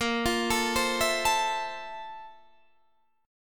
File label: F Major 7th, Bb; Fmaj7/Bb; arpeggiated